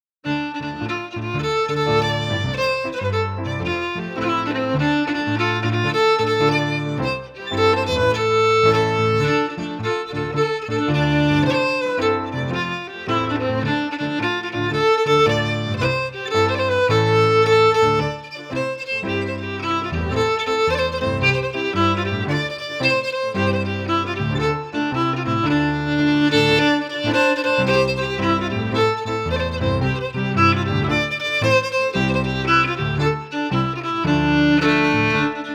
FIDDLE PIANO; Jink & Diddle Book o' Tunes 2022

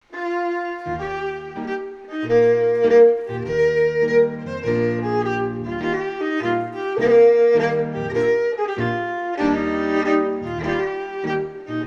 Reel FIDDLE PIANO; John Macglashan col 1796; The Jink & Diddle School of Scottish Fiddling

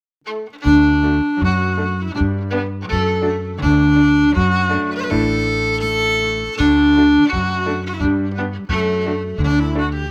MARCH FIDDLE PIANO; Jink & Diddle Book o' Tunes 2022

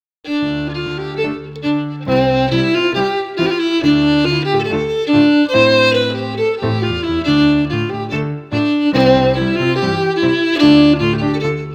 FIDDLE PIANO; Jink & Diddle Book o' Tunes 2022

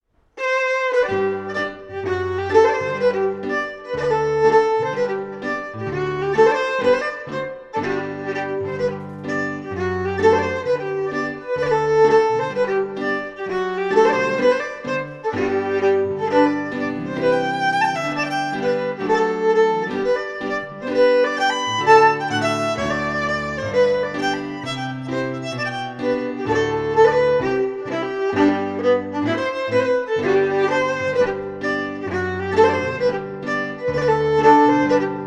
Reel FIDDLE PIANO; John Macglashan col 1796; The Jink & Diddle School of Scottish Fiddling

